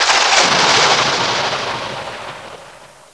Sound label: Room Thunder; Thor; Playable Tutorial Level; TR1